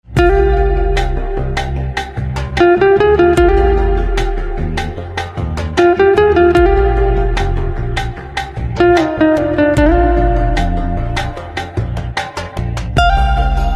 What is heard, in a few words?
Guitar